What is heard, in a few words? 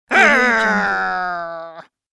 low female anger whirr